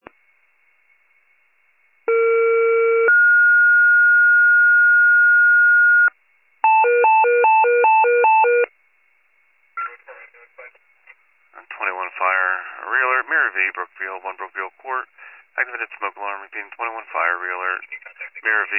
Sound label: fire, pager alert